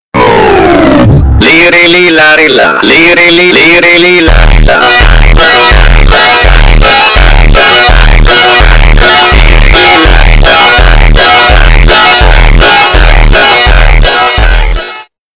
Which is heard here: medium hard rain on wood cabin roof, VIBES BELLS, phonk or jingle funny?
phonk